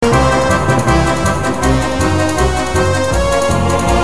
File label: Game Tune